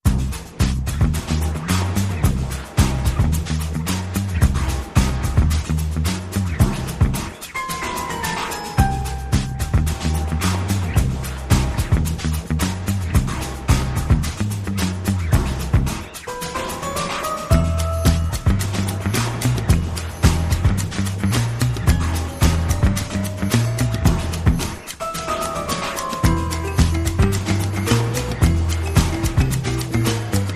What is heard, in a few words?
No Orch